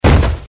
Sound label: thud sound effect; Mario1-1